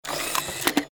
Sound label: electric door